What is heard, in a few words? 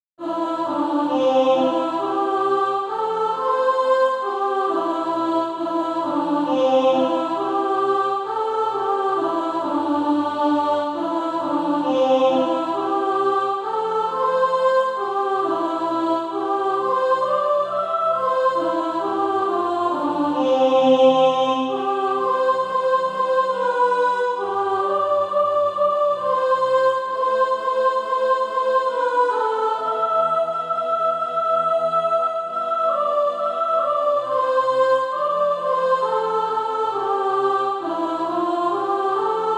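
soprano, Practise Music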